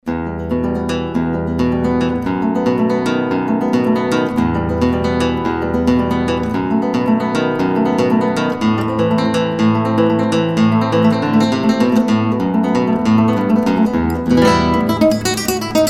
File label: Guitar Rumba Arpeggio